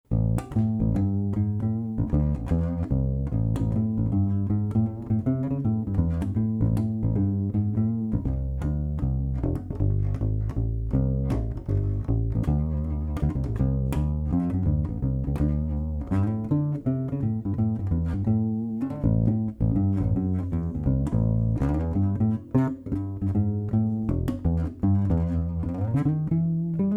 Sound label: CannaBass Evo; cannabass evo birdseye